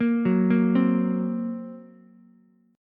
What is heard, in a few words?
Gb Major Flat 5th, down, Ukulele; arpeggiated; JGuitar